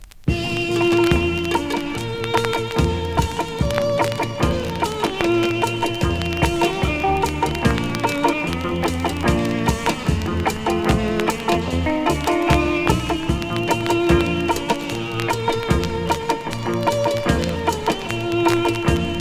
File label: REGGAE